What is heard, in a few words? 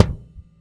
Swinging, Dimensional RL Kicks; Airwindows